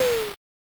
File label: Dive; Robot 64